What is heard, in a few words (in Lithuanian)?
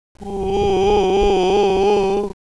vibrato lėčiau